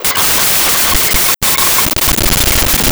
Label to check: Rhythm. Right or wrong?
Right